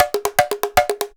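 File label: emelako grooves; Best Service; World Colours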